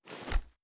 ar xmag reload fast end, weapons; gmod; viper

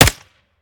sup; viper

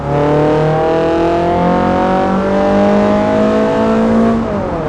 second, vehicles; gmod; tdmcars; focus svt

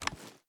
reload drum tilt; gmod

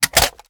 reload fast mag out; gmod; viper

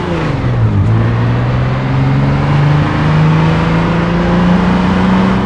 third, vehicles; gmod; tdmcars; transit

